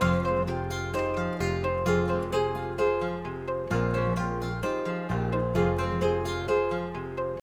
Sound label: guitar, Jazzmatazz loops